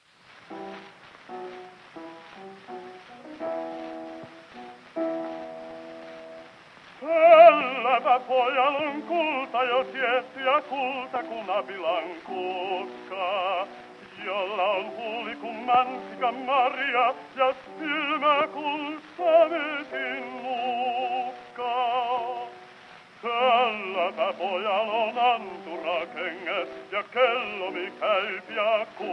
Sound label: Gramophone